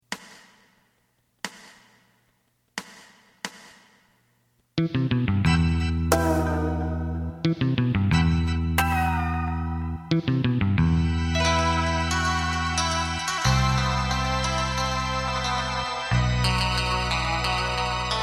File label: drum